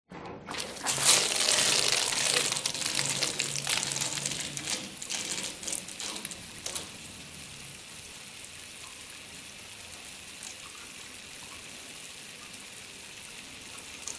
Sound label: public places public toilet urinal flush metal trough close up